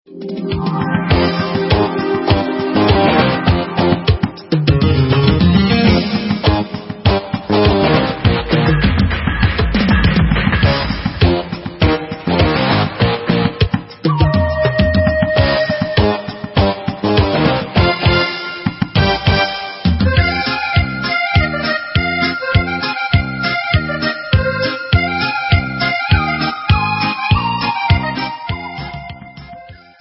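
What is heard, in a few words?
Instrumental, Israeli Dances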